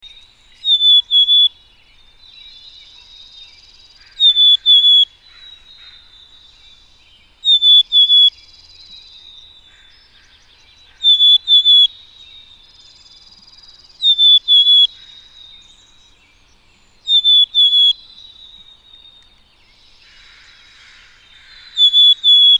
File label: Black capped Chickadee Poecile atricapillus occidentalis